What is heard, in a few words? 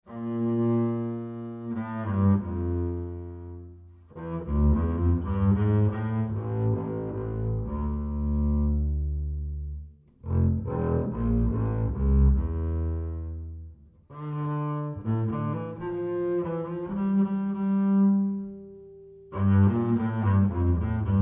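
acoustic bass Bowed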